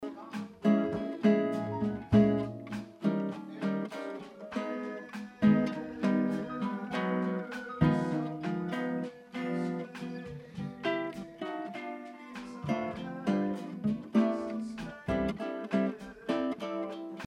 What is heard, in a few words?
Guitar Bass removed